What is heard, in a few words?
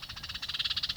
shorter warning chime